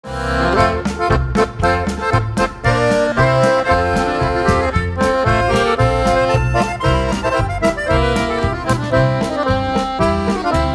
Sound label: Polka